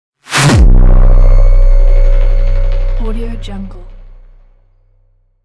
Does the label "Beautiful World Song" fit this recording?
No